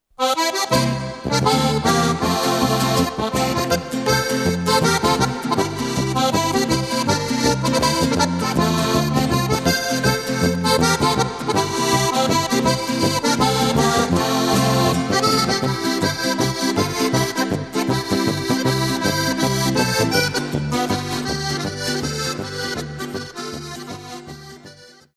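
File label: donnerbalken polka, Steirische Harmonika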